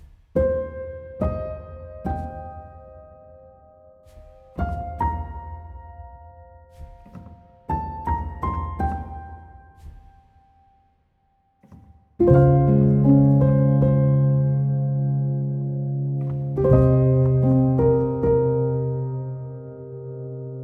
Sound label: Sleepy Version, Piano Version